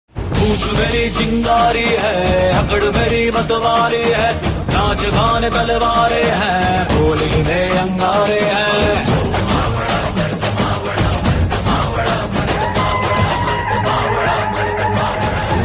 Song Ringtone; Tanhaji

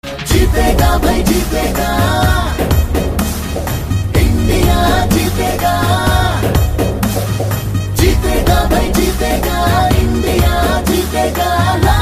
Song; ICC Cricket World CUP 2019

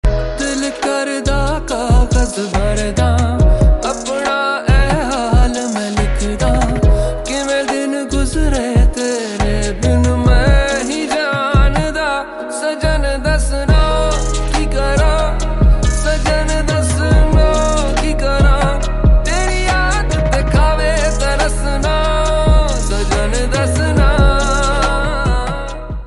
Song Ringtone

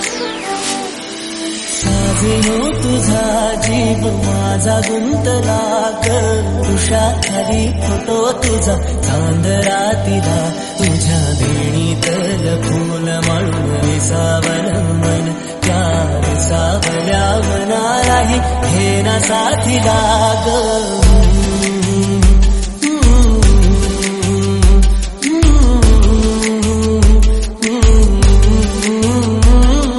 Marathi Song

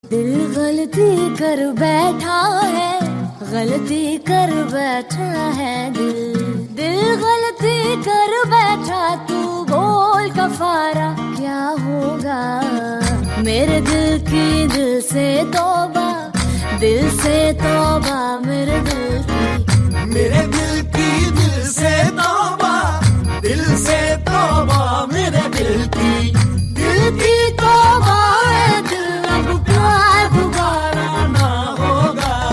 Sad ringtone

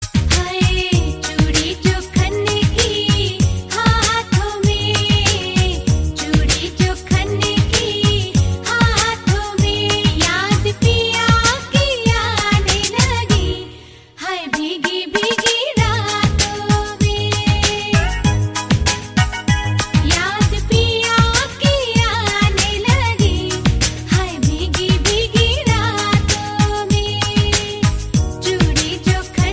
Piano Varsion Ringtone

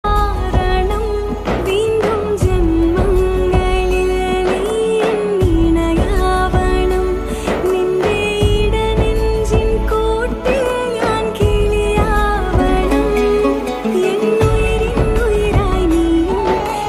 Malayalam; Kgf 2

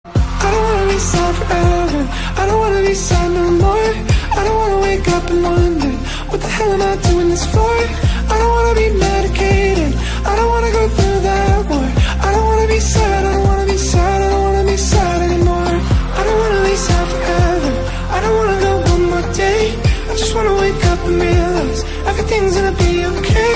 Sad Ringtone

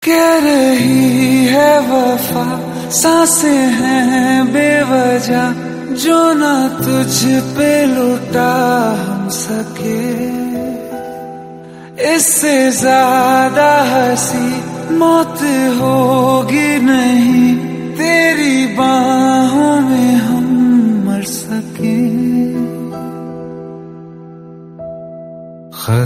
Very Sad Song Ringtone; Marjaavaan

